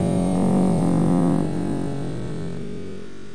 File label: granulated demon snoring; Various Strange Sounds and Effects